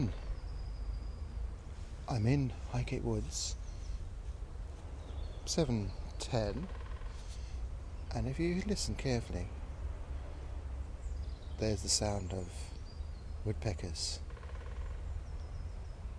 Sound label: woodpeckers highgate woods